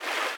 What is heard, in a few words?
fast water, river sounds